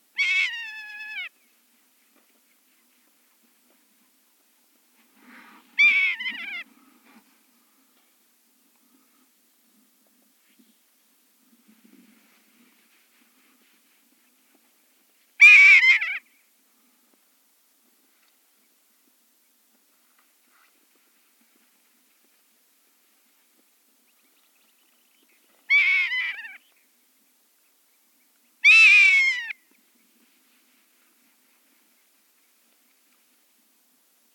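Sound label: zimnyak golos, rough-legged buzzard voice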